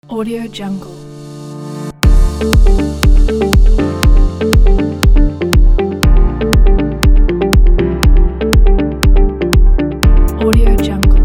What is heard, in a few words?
technology corporation background presentation